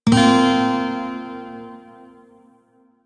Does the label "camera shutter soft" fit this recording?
No